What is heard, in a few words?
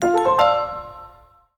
Resources Low; Ellen's Garden Restoration; android